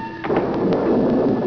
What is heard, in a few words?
storm